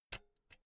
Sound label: pull out jack cable slow; objekte; elektronik